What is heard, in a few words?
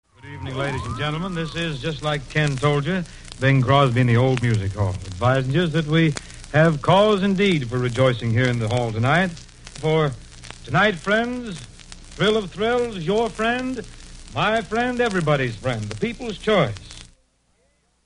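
crackle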